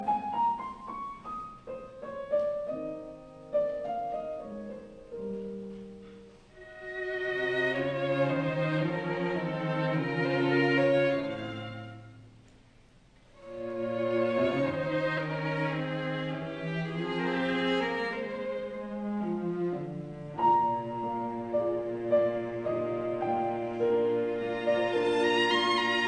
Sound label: Piano Quartet in E flat major Larghetto; K 493; Budapest Quartet